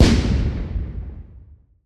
Howitzer, M101, 105 mm, Distant, Right Side, Shot, Explode, Crack, Sweetener; Airborne Sound; Battlefield Howitzers